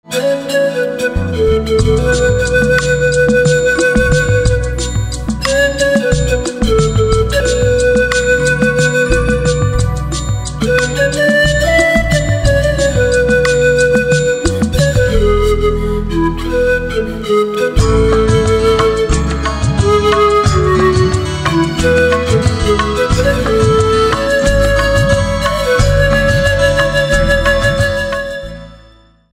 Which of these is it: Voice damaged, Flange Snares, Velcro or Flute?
Flute